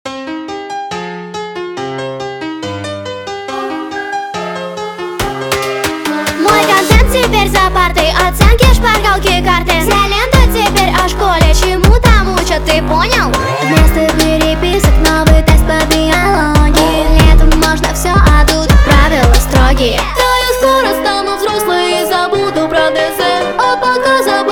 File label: fonk speed up